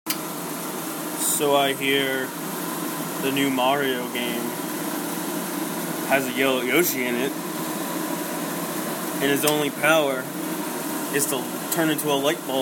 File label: hot lightbulb